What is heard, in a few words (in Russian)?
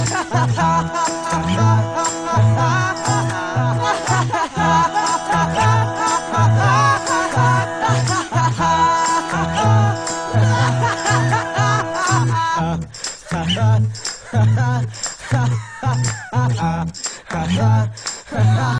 smeh na sms haha